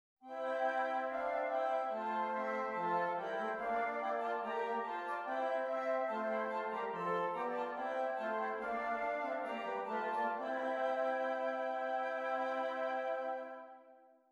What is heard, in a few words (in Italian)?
esercizi di doppio contrappunto nella decima a quattro